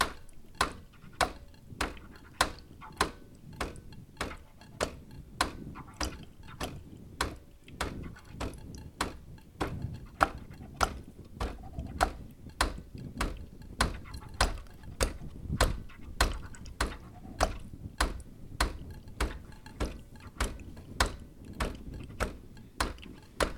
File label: cleaning and descaling for espresso machine